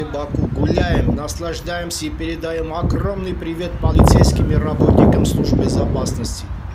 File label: wind voice fragment; Armyane v Baku